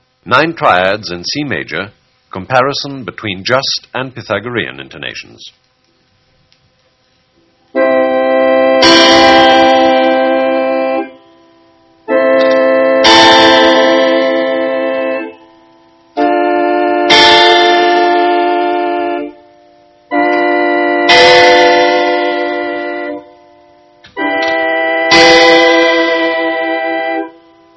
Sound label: Just Pythagorean